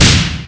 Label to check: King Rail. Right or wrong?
Wrong